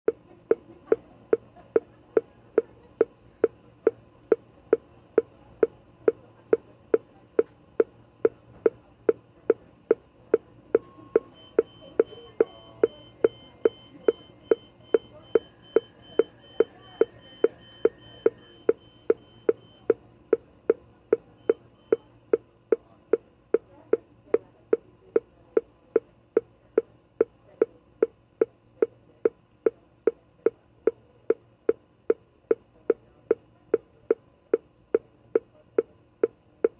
HOSPITAL EQUIPMENT HOSPITAL: Pediatric intensive care unit, baby heart beat monitor, with background.